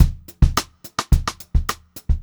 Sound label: BEAT, Funk-Groove Drumloops